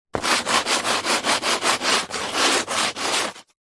industrial sanding large piece of wood sand paper slow